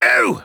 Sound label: Tesla hit